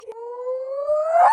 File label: smol howl reverse